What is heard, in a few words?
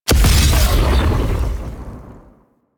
Throwable Coolant Item Explosion; Ambience; Warframe; Venus Open World